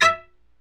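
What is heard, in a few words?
E1 SPICCATO, CELLO; Northstar; New Gold VOL-2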